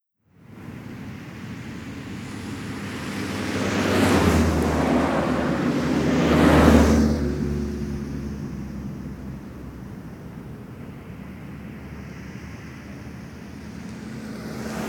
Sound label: vegas Traffic